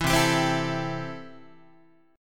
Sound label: C 6th C down Guitar; C6 strummed